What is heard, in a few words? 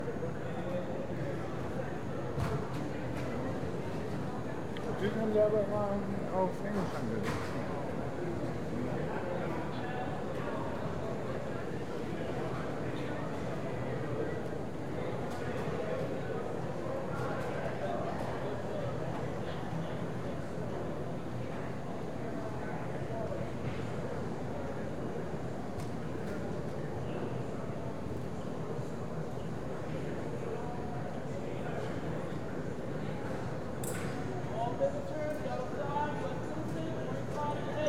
Ambience Airport Newark International Customs Waiting Line; AIR TRAVEL; ShapingWaves